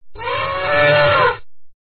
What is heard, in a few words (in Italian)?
Elefante